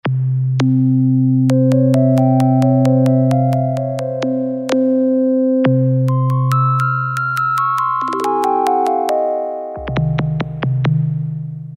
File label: eerie; Latin And F.X. Percussions; Roland SN-U110-02